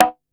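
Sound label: Drum Analog Kit Conga High Open, Yamaha RM1X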